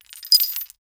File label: equip chainmail torso, cloth